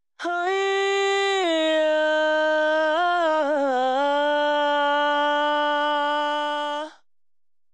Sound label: 120BPM G AIRY VOICE DRY